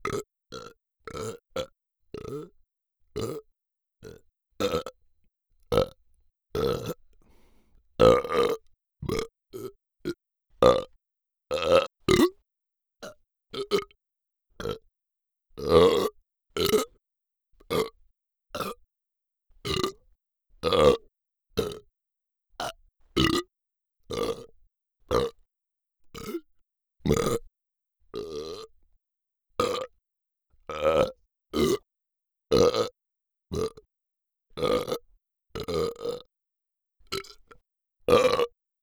burp, Anechoic; OPENAIR

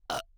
burp; OPENAIR; Anechoic

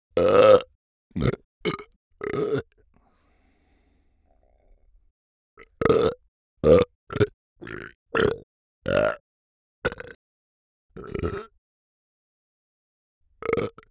burp, Anechoic; OPENAIR

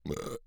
burp; OPENAIR; Anechoic

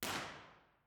central hall; central hall university york; b-format

